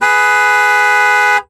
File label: Auto Mini Van Horn Long; Transportation